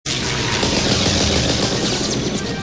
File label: plane